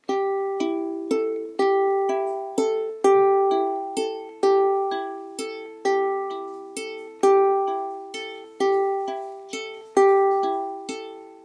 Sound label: fingerpick, ukulele